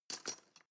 cutlery teaspoon drawer place

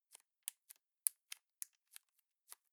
plastic bag small clasp close

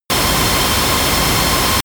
bomb fly fall

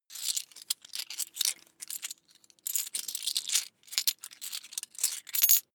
coins big clatter long

